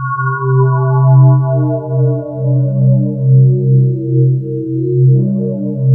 BELL STEE, ATMOSPHERES; Sound Gallery - Charged

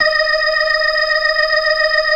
FAST VIB, ORGAN, TREMOLO VIB; Keith Emerson Signature Series

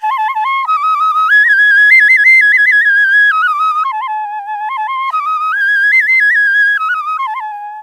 INDIA PICC; Spectrasonics; Heart of Asia; IND PICC PH

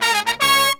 120BPM, Horns

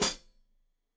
Hat Foot, Hi-Hat, Cymbals Stereo

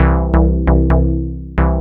2 Step 133 SYN, House; USB Soundscan vol.51; House Side Of 2 Step; AKAI

